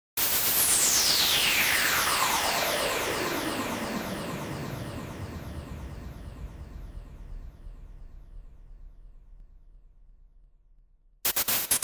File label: noise fx